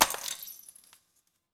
smash chair; monsterplex

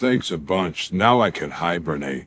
bear outro; the jewel weaver

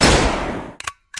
gun shot; LegendsOfCoyoteGulch

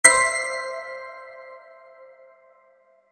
casino win

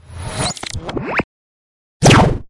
vortex; LegendsOfCoyoteGulch

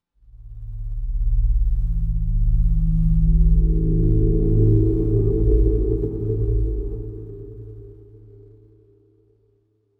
solar buzz; orbit

